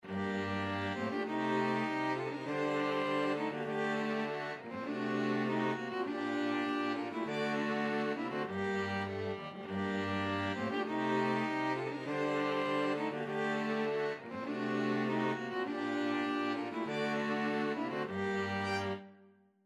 Counterpoint Harmony; mini challenge

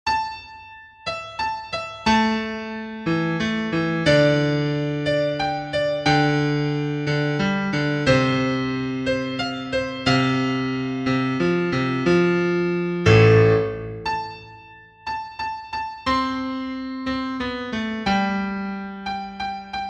March, solo piano; 8 pieces for solo piano

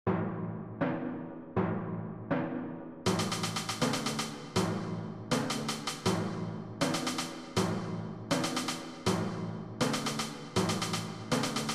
overture brass; Brian Boru

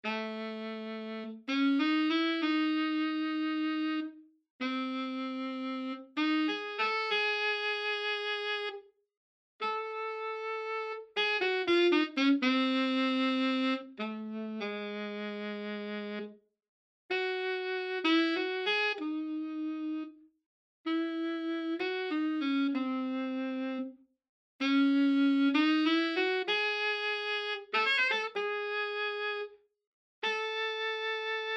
SAX SOLO

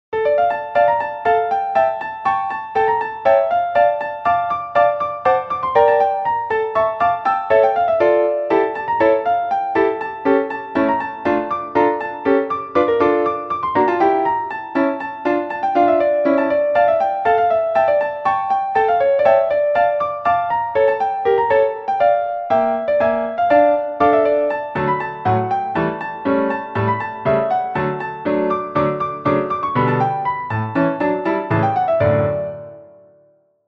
Polka

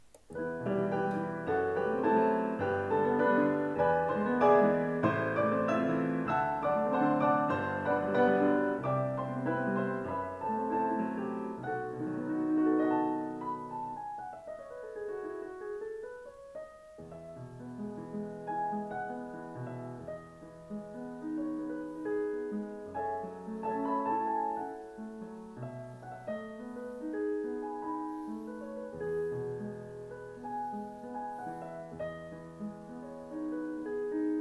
Piano

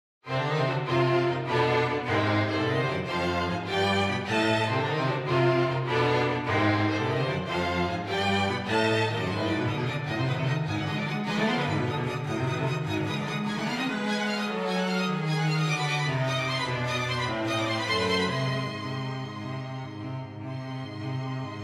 String Quartet Octatonic

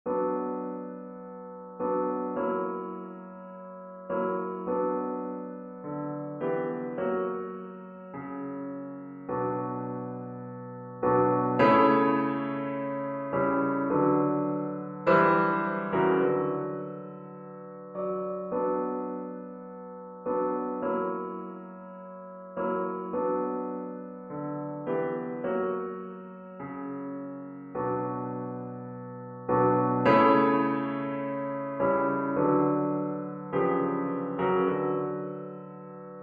Exercise Harmonic Extension

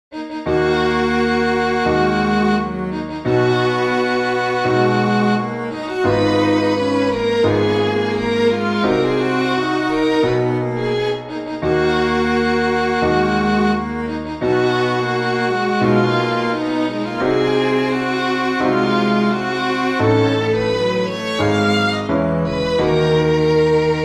Lullaby Variation

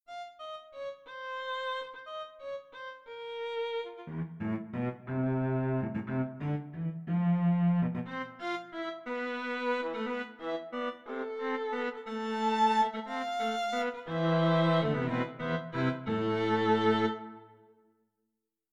Two Sententiae for String Quartet

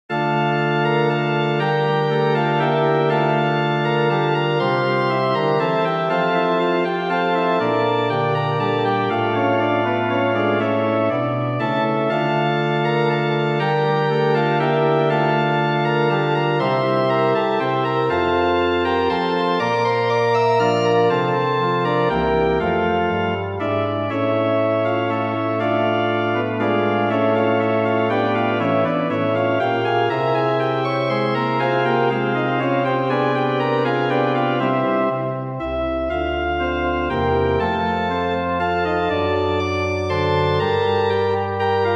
chorale in d minor

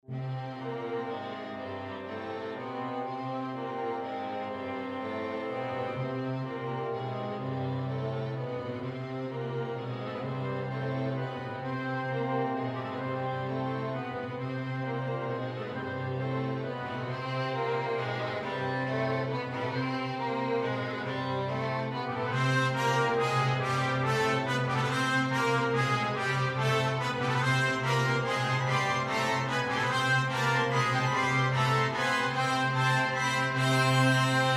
dramatic ending

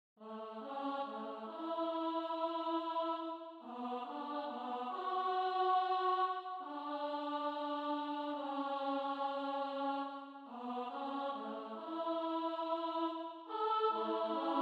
Canon 3 voices in A M

